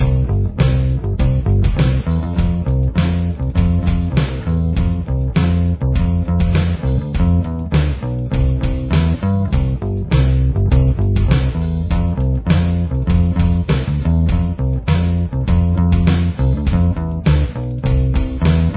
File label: verse bass